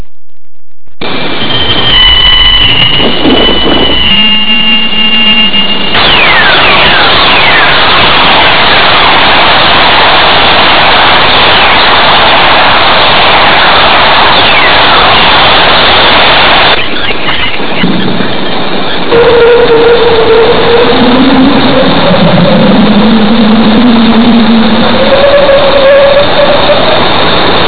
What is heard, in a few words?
aurora vhf, Radio